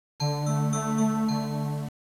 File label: Undo, earcon